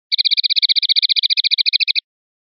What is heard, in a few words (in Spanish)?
rep carretillas, pardillo